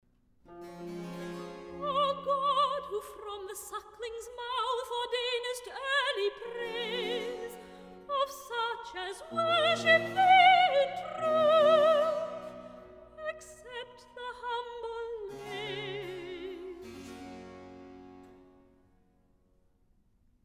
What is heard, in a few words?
Recitative; George Frideric Handel; Esther 1718 version; Purim; 'O God, Who From The Suckling's Mouth'